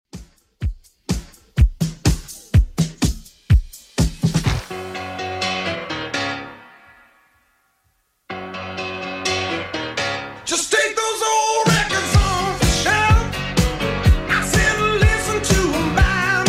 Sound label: 70s Redrum 125bpm